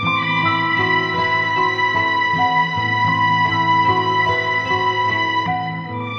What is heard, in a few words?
strings worries and regrets; Loops; Sad